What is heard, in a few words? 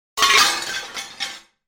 pot break sound